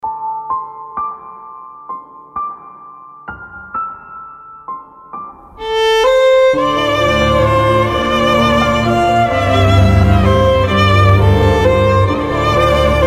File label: tamil; ringtones